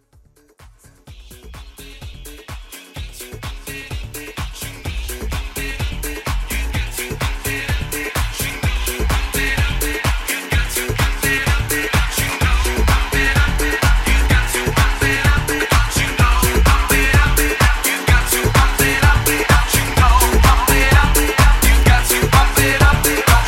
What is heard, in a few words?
Afro House 127 BPM